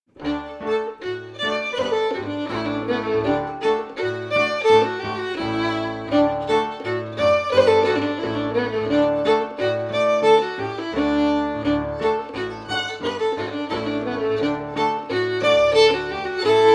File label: FIDDLE PIANO; Jink & Diddle Book o' Tunes 2022